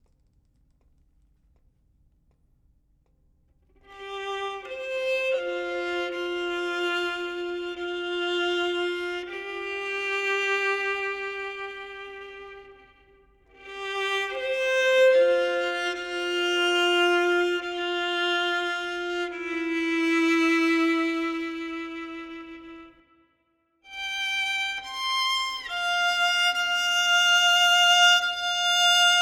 Theme Violin; Get Even

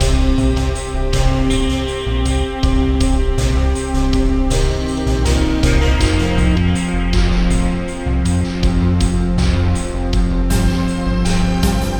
Suspense; beta unreal

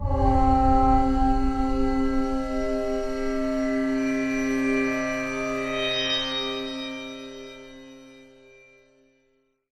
Music Stinger; Hunted: The Demon's Forge; x360

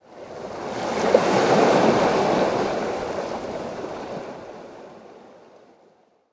waves; CS Level2

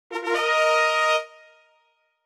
Fanfare Collect Chest; the princess bride

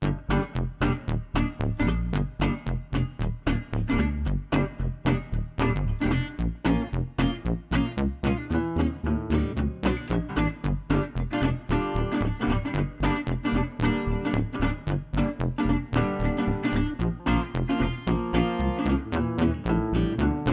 Battle Layer Synth; Plants vs. Zombies Battle for Neighborville

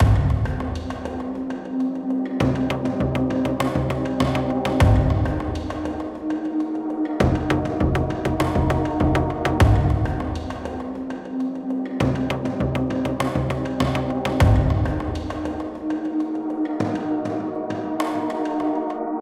Level Presentation; Militant

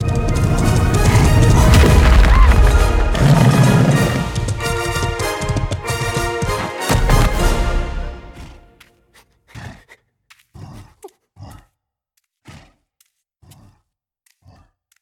boss Outro; Level 01

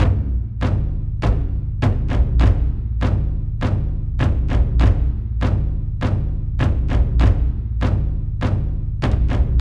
Drums; For Honor

